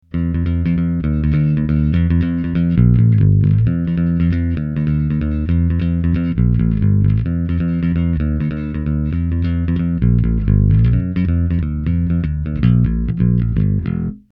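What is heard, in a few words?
svt picked, Bass Driver D.I.; sansamp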